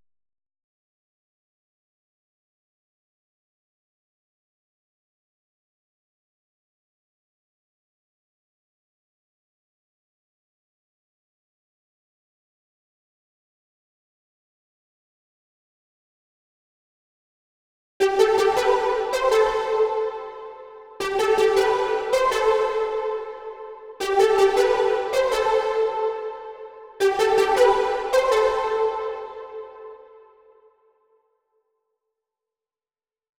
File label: lead